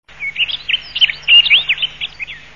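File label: rustic bunting voice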